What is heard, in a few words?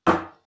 hit